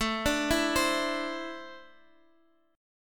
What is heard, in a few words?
D 7th Suspended 2nd, chord